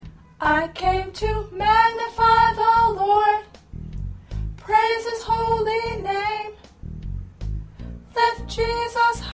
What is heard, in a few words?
Soprano, choir parts